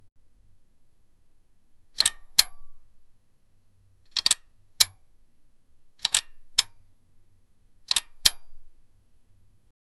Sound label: a smooth satisfying metal, slide